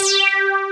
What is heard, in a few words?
ACIDIC BS; Pro-Rec - Synthsonic; BASSES